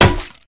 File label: block, shield; item; Minecraft; Votive World